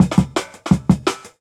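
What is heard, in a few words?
Jungle Breaks, 170 bpm; Original Jungle Breaks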